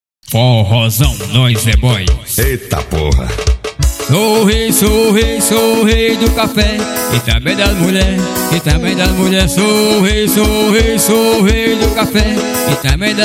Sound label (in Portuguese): forrozao